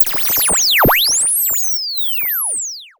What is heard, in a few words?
Tuning Laser beam